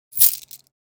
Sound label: Casino coins being moved around in hand